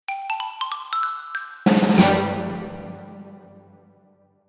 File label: Happy Victory; Background; Game Winning